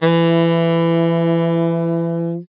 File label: Sax